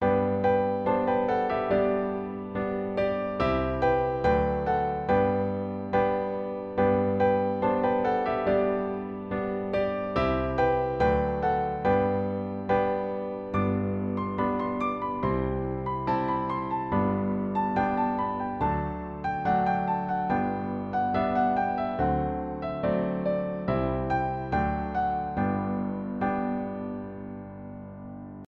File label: Ding Dong; Piano app by Yokee